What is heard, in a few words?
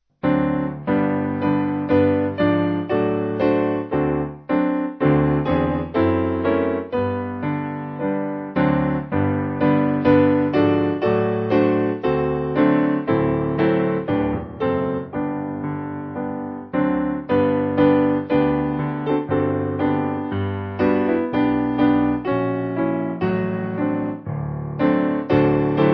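Piano, Music Backing Files for Churches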